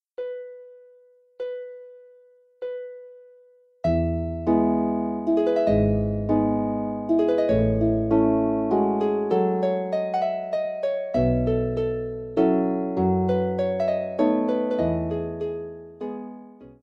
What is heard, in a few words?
Musettas Waltz, harp